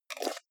jar plastic midsize close fast

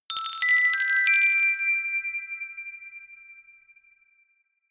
notification glockenspiel rattle sequence jump

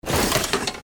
machine scream

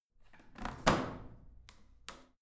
washing machine drawer in squeaky

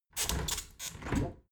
window open heavy sequence

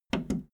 video tape player plastic fast forward click slow

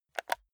console controller home press fast

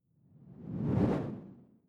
whoosh simple flutter low

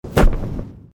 shot

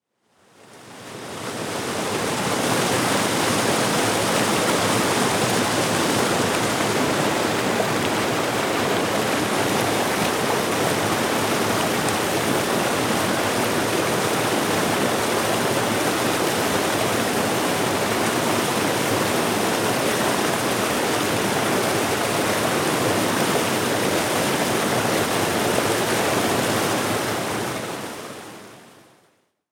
waterfall

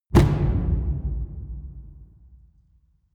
drum mid loose

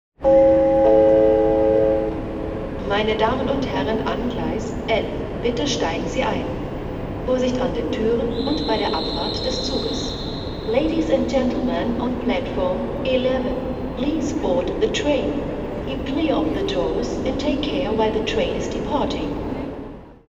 railway station german english

